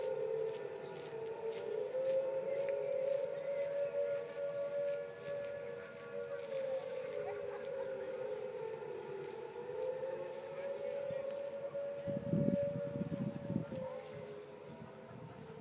air raid siren in the distance; at powis castle

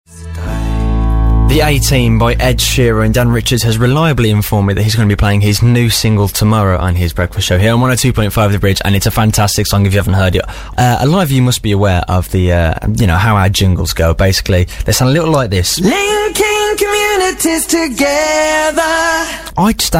attempting to play a piano

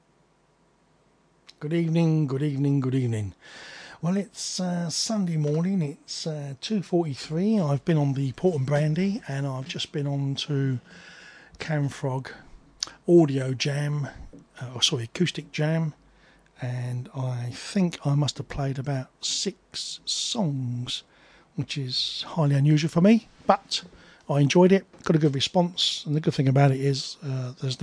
acoustic jam

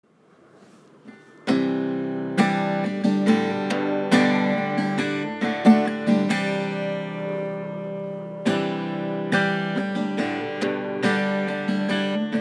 riff

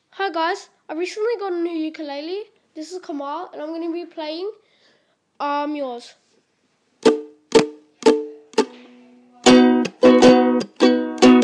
playing on ukulele

